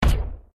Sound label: plasma